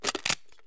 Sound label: drum use; Escape from Tarkov